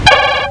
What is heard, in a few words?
Phaser